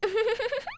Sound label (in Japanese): うふふふ